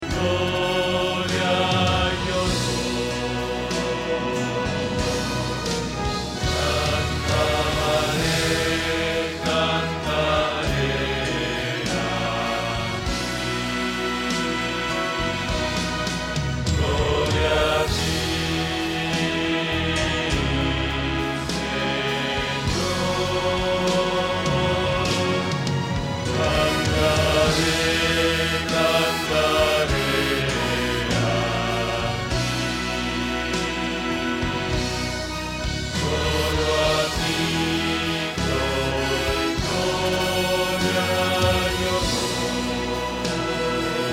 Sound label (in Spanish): Bajo